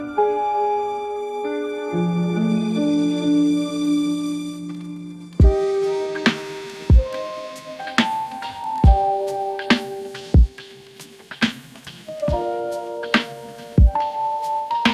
elegant strings and chill piano

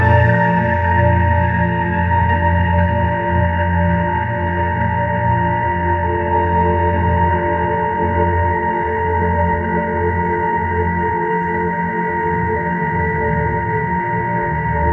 ethereal dreamscape ringtone

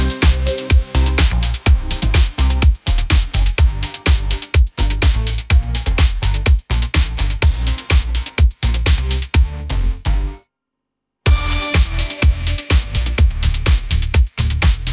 disco pop groove machine, ringtones; ai

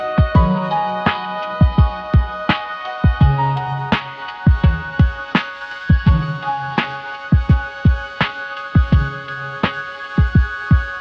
elegant strings and chill piano

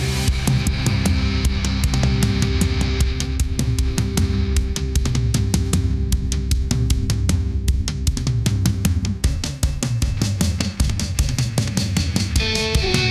upbeat pop melody, ringtones

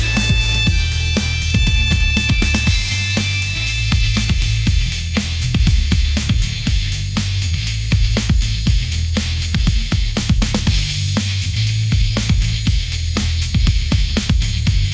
electric pop metal fusion; ai

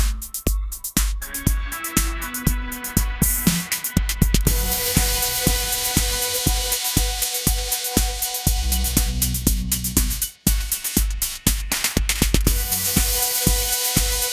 fast lane pop anthem

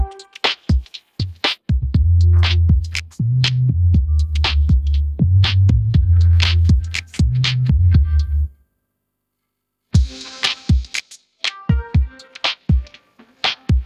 upbeat pop melody

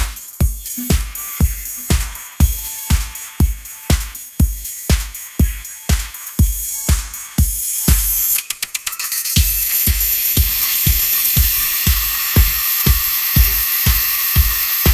sunshine pop vibes, ringtones

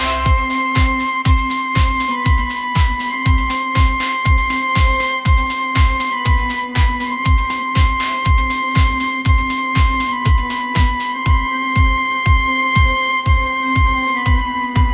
pop voyage, ringtones

